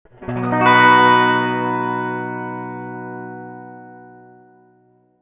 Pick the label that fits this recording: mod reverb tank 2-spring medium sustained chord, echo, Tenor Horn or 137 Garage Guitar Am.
mod reverb tank 2-spring medium sustained chord